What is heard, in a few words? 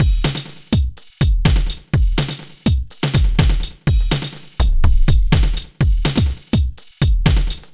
Beat, Drums, Eclectic Electronica